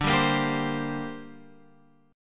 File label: D 7th Suspended 4th Sharp 5th, chord; D7sus4#5 Chord {10 10 8 X 8 8} strummed